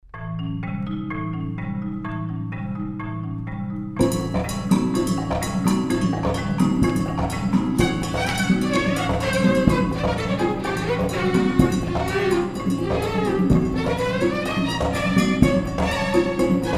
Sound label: marimba